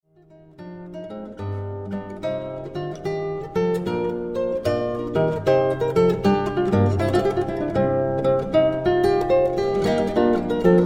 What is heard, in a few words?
Classical Guitar Greensleeves